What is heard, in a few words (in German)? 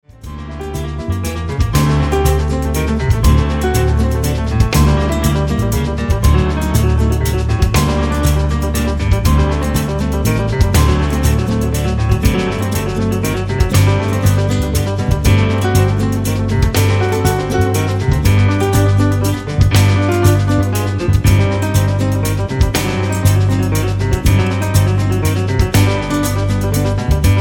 Kleines Praeludium in C-Moll